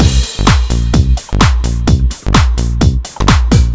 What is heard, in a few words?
128 bpm full, combi loops, nu dance electro; Zero-G; Soundclash; Monofonic